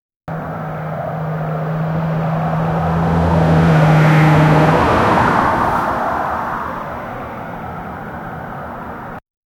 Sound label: the sound effect of a, mountain